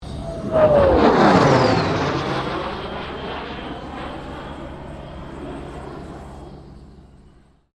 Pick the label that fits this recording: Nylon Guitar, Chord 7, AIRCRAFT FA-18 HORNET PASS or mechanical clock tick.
AIRCRAFT FA-18 HORNET PASS